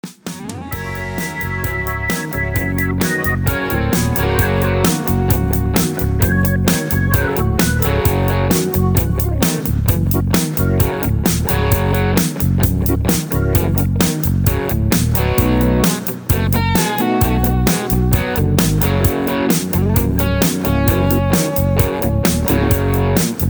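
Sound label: no leads with background vocals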